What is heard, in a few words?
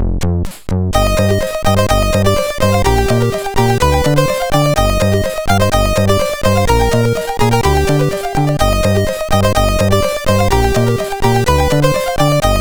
sid MOS6581R2